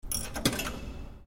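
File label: turnstile sounds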